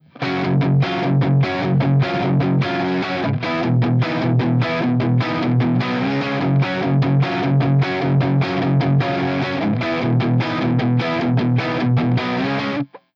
Strat Hot Neck Dirty; BYO Pickups

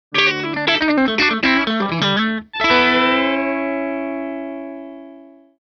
Vintage Plus Country; Tonerider

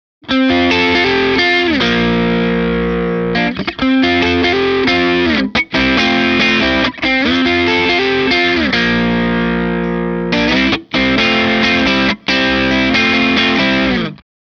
Vintage Plus Rock; Tonerider

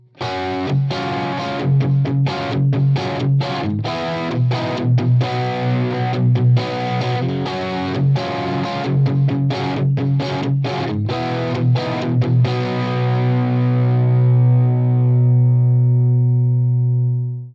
Vintage Bridge & Middle Dirty; BYOG; pickups

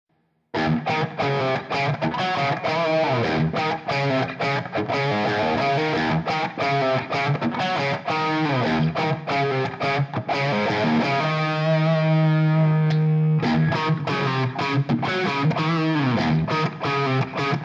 pickups Riff through switch positions; Blizzard of 59; BYO Pickups

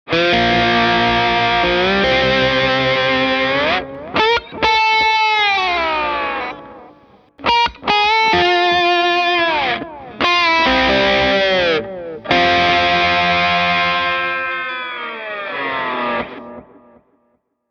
Vintage Plus Slide; Tonerider

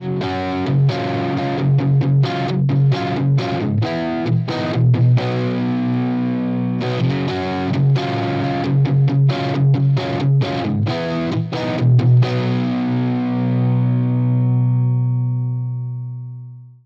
Vintage Neck & Middle Dirty, pickups; BYOG

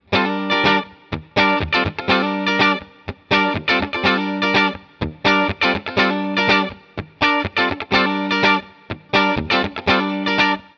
Strat Hot - Bridge - Clean, pickups; BYO Pickups

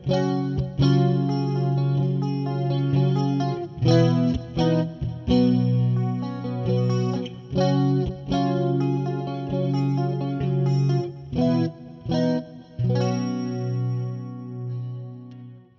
Vintage Neck & Middle Clean, pickups; BYOG

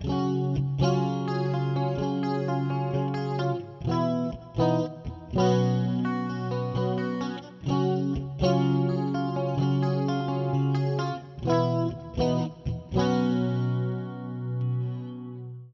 Vintage Bridge & Middle Clean; BYOG; pickups

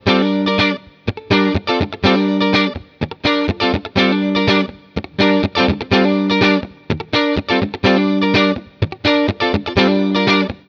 Strat Hot - Middle - Clean, pickups; BYO Pickups

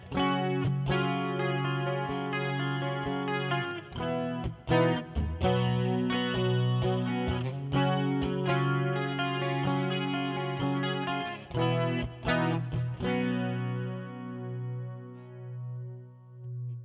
Vintage Bridge Clean, pickups; BYOG

